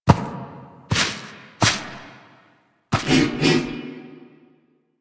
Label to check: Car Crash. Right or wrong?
Wrong